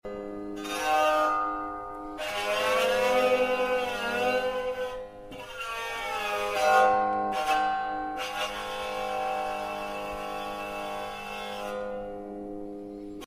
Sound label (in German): Steppencello